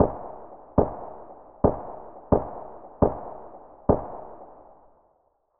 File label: mutant tap slow; SPT Battle Ambience